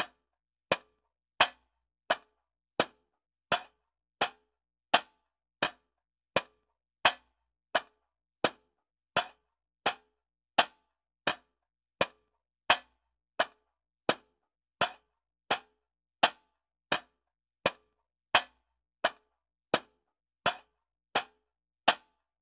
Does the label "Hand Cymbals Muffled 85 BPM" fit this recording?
Yes